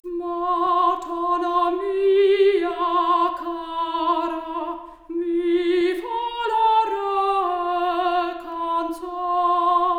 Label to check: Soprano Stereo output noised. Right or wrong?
Right